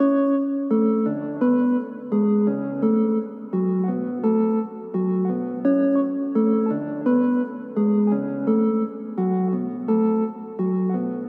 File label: 170 Gb Minor